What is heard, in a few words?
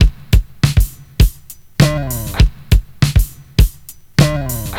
100 bpm breakbeat b key; tuned drums